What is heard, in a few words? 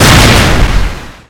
Shock Rifle Fire; weapons; Requests Studio; UT3